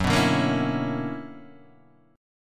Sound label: B-Minor Major 11th